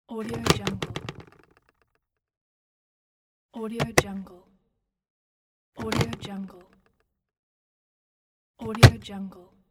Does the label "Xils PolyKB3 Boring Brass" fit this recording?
No